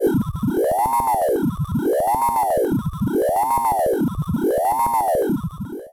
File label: alian heart